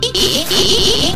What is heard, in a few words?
duck eee; dont hug me im scared